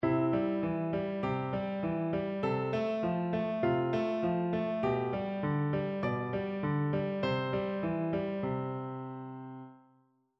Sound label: alberti bass